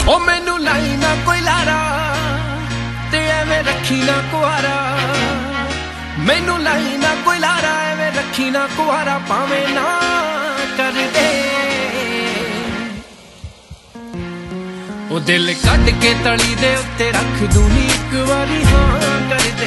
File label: punjabi ringtone